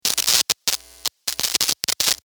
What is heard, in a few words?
sparks, Electric Spark; Sustained Hum And Spark , From Guitar Cable